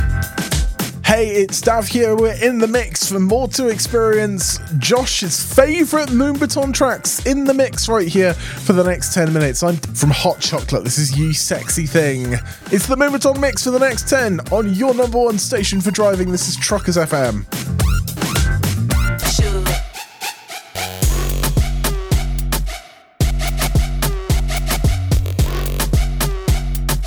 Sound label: MOOMBAHTON MIX